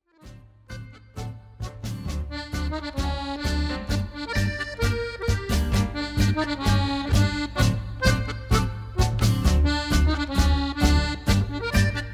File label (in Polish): akordeon